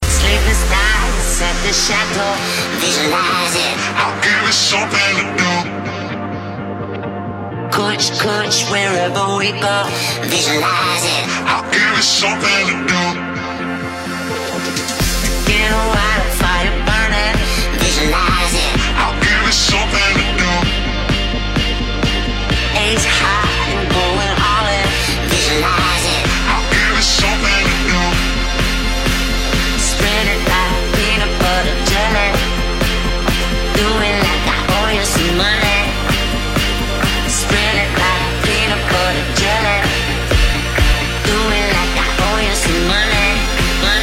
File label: EDM SESSION